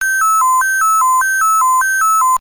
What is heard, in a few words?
Nextel Ringer